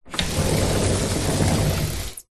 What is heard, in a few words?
window sliding open; zapsplat